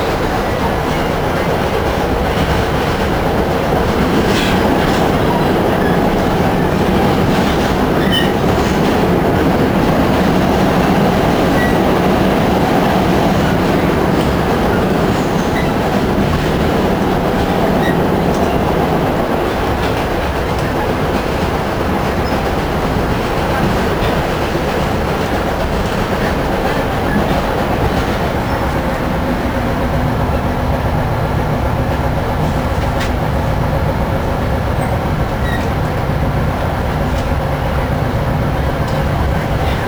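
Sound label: NY Train, interior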